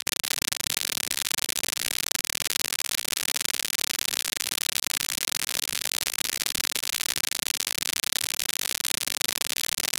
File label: pixel flesh sound